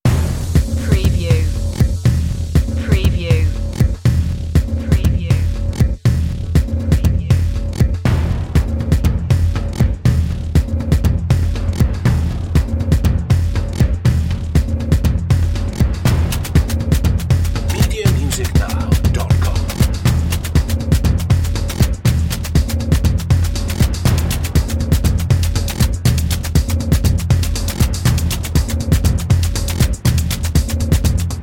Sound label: voodoo dance